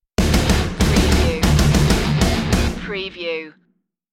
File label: exotic aggression stinger